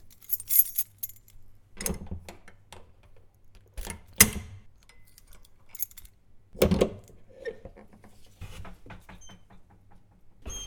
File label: cellar door spring locked